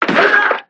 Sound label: American football; Sfx Americanfootball